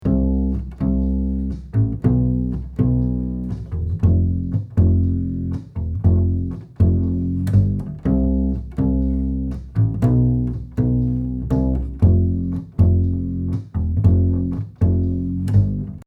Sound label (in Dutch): Contrabas probleem